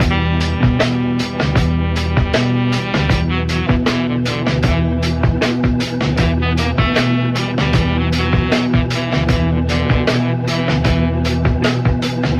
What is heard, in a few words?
full sax on sax and keys; Loops; Phonky